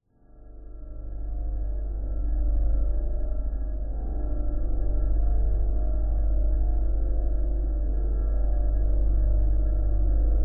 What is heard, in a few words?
science fiction power field atmosphere electric forcefield whirring